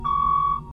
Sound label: proximity alert alarm